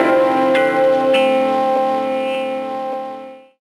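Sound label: PAD guitar; Rawcutz - Nu Forms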